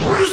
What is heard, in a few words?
bonus; noiz2sa